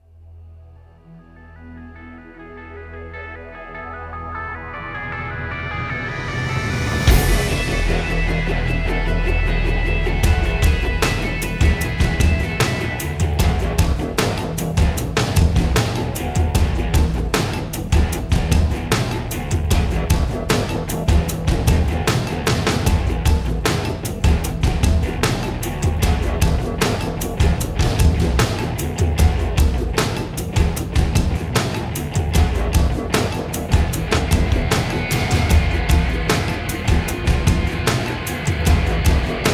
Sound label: MENU; Space Chimps